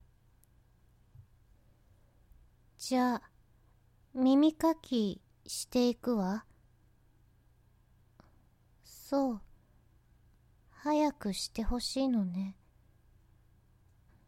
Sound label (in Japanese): 耳かき A; クールさん